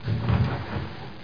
DOOR OPEN; Image Bonanza; Expert Software; househld

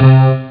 old, stabs

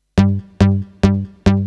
Bass; Techno Music Maker

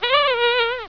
bee

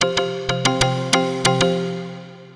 Synt; FUNKIN&GROOVIN'

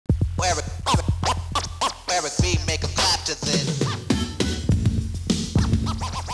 clap

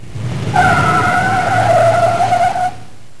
Floor It; FWB Stack

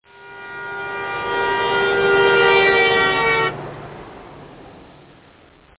traffic

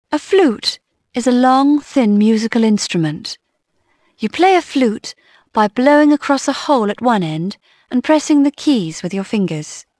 flut; Dorling Kindersley My First Incredible Amazing Dictionary

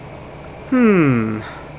pondering, People; Sound Library 2000; Wayzata Technology

